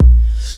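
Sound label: bass drum hip hop, drum kit; DRMKIT; E-Lab; X Static Goldmine 2; akai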